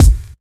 original bass drum g sharp key, bass drum tuned to the G# note. Loudest frequency: 672Hz